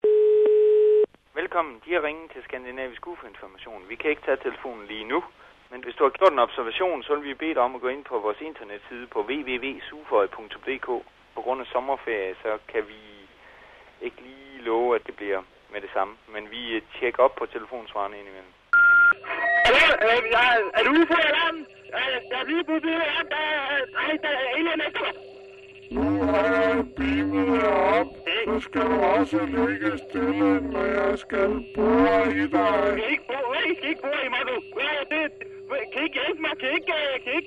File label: ufo